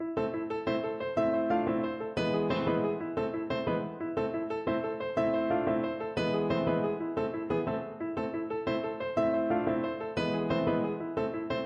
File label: Wilder Reiter piano